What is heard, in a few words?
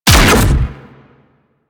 Electric Tether Shot; Railjack